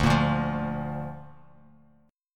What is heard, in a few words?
D Minor Sharp 5th D down Bass Standard; Dm#5 strummed; JGuitar